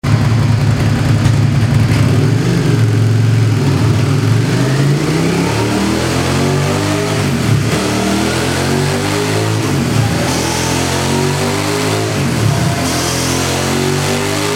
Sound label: SUZUKI SV650 OVAL